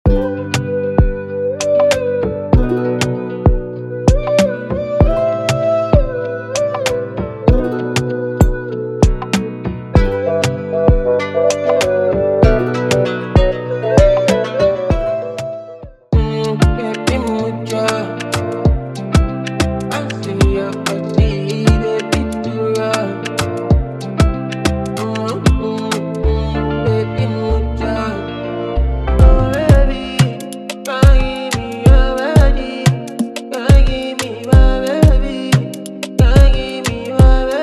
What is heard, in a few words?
Afrobeats Sample Pack; BAM BAM